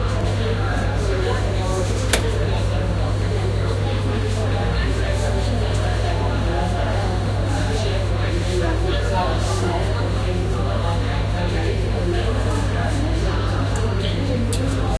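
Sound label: cafe background noise; background chatter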